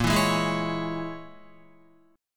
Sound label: Bb Minor 7th Flat 5th, Guitar; Bbm7b5 strummed